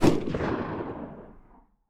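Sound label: shotgun exterior shot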